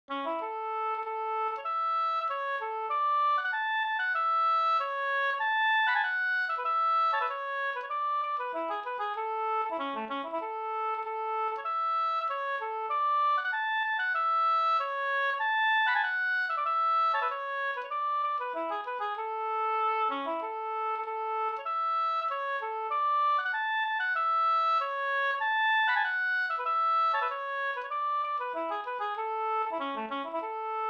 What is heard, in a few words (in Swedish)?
ganglat; Stockholmslaten av Jons Jonas Hansson Boda